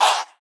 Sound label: phasered chant vox; drumkits; voxes; fl studio